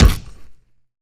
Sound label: Hit, Zombies, Attack; zps